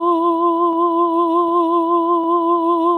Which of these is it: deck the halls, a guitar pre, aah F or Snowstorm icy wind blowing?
aah F